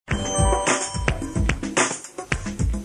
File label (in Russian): Музыка